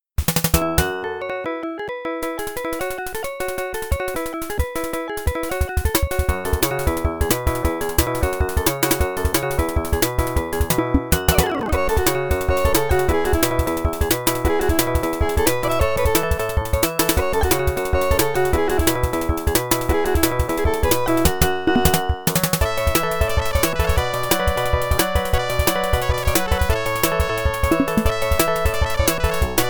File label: BGM (Fast); Block Block; arcade